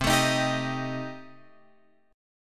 C Minor 6th Guitar Open F, chord; Cm6 strummed; JGuitar

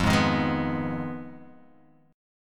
F Minor 7th Flat 5th, Guitar, Open G

